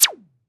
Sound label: syun